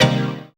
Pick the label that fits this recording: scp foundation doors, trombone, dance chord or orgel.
dance chord